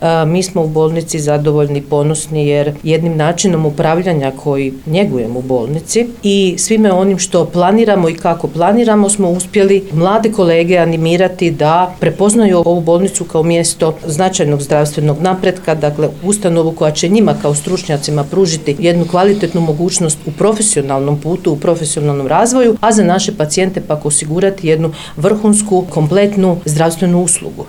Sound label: POP